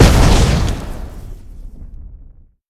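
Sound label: magic fireball stereo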